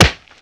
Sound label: Kung Fu Old School Hit, Hand To Hand Combat; Sony Pictures Sound Effects Series Volumes 1-10; Sony Creative Software